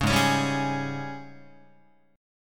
G sharp Major 7th Suspended 4th, Guitar

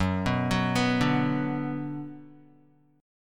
Gb Suspended 4th Sharp 5th Gb Guitar; arpeggiated; JGuitar

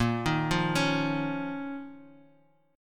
D sharp 7th Suspended 2nd A sharp

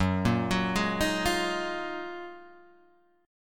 F sharp Major 13th Guitar Half Step Down

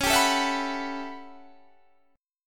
A 13th C sharp, guitar chord

